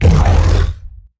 Stealth Attk; Evolve; Behemoth

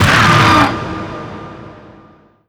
strider pain; Strider Remake

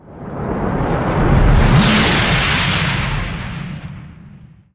Lightning Strike Activate Start; Evolve; Kraken

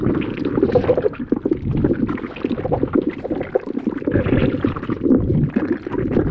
Spider Trap Hold Loop; Lurker; Evolve; Gorgon

